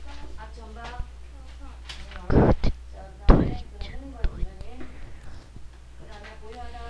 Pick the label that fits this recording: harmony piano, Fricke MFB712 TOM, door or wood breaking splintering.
door